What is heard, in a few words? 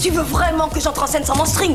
string loop